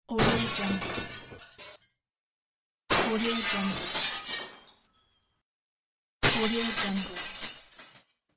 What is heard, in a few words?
thrown glass